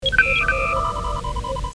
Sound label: hailing frequencies open, computer